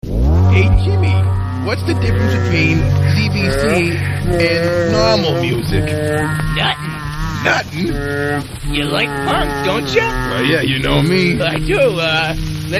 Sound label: Normal Music